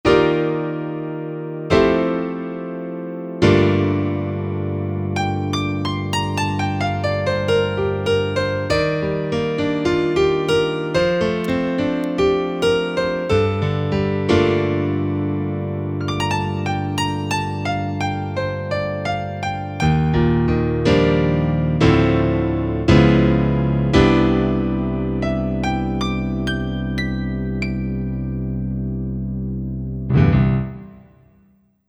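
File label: Stereo Piano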